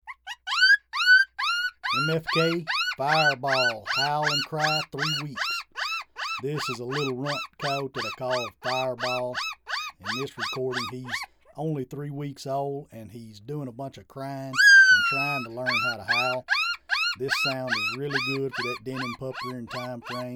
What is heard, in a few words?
Howl n Cry 3wks, Game Calls; Fireball